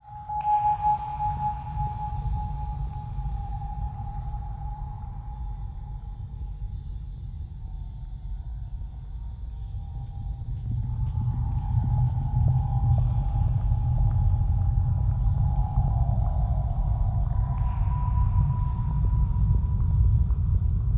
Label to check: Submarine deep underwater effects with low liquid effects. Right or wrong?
Right